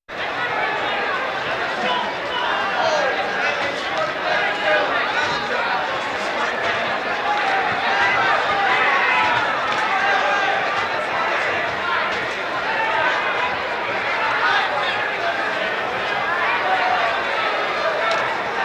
Walking Machinery Trucks

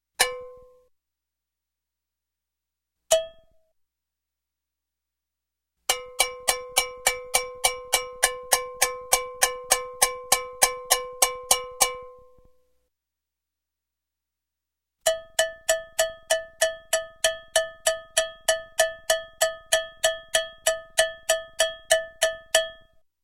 Cowbell single Hit, Music, Percussion; Sound Ideas; Series 2000

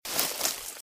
Footsteps - Bush walk - Left; Eden; RadwinIsland

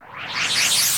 NOIS; XXL 800 Production Effects

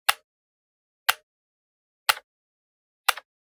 Generic Button Metal Small; boom library; The Interface

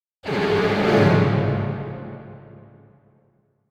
Symphonic Hit Strings Calls - Fast; Hollywood Edge; sound designer's toolkit v2

